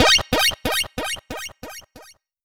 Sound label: Arcade Echo FX, 8-Bit; 8-Bit Kit Volume1